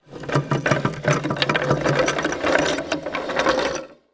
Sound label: Impact Movements Metal Chain Dragged Across Wood Board Walk; Impacts